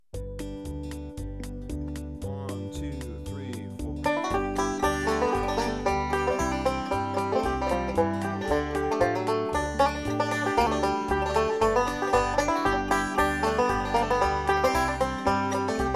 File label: arab bounce stereo, banjo